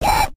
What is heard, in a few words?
Goblin; Goblin Old; Going Under